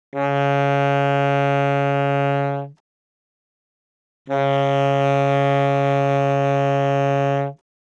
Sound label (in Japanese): Single Reed, 気鳴楽器; Saxophone